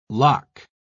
lock

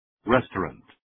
restaurant

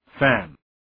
fan

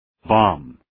bomb

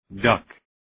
duck

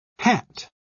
hat

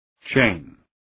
chain

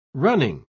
running

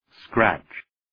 scratch

